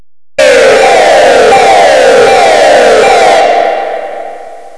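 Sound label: siren; サイレン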